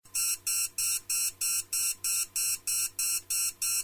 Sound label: Buzzer Alarm